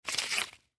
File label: Ski Goggles Up, Ski Goggles Pick Up; Skiing And Snowboarding; Blastwave